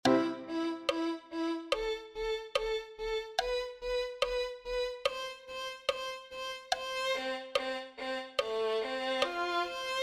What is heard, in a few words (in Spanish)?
santo santo santo violin